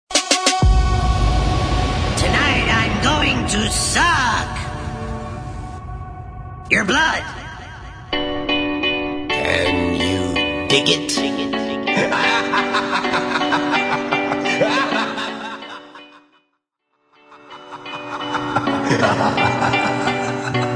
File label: Halloween